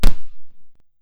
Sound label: bounce, dodgeball